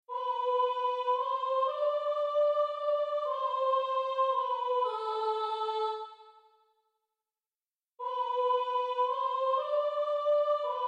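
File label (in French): soprano, enregistrements audio séquenceur; ojesusmajoiedemeure; Bach; Classique; Chorale 3 voix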